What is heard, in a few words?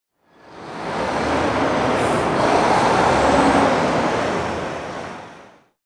TRAF TRK, traffic; zones